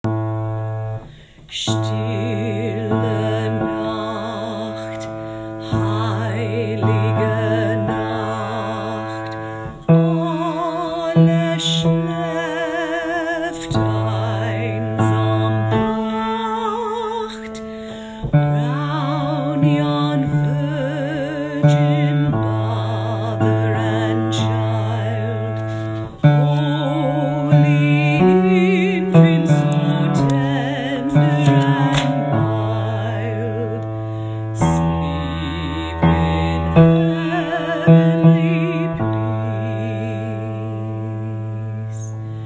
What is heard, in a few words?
Silent night bass